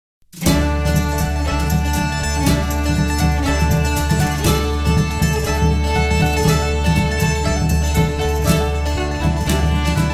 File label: Instrumental; Give Me Oil In My Lamp